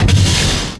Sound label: walk; zaero; monsters; bossz